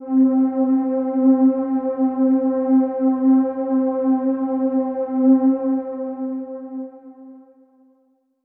PAD Warmth (C); Melodic One Shots; Cymatics; ANALOG EVOLUTION Beta

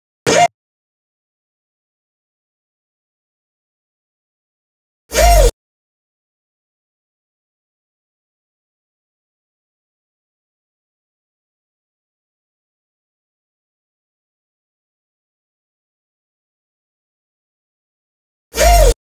143 BPM D# Min Woo; Melody Loops; Loop Stems; Cymatics; Hyperbass Pt 2

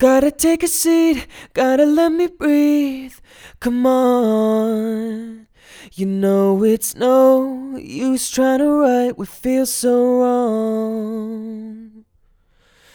Male Acapella - C Major 150BPM; Male Vocals; Acapellas

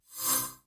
Flash

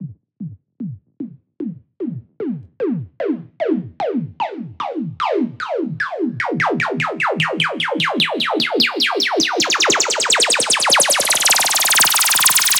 Riser

